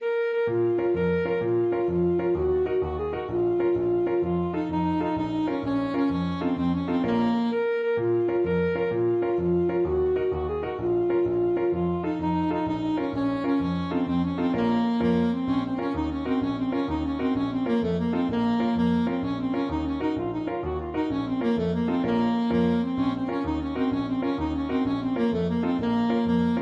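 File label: alto saxophone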